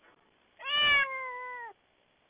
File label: cat meow